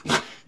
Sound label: cub; minecraft; technic